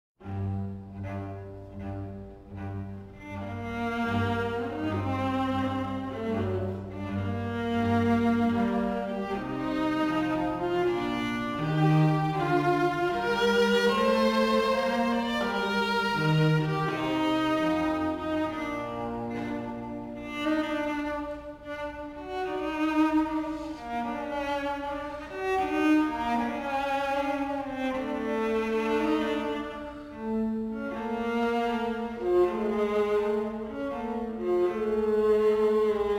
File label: Ruhr prelude variations; Excerpts from the 2022 Concert Series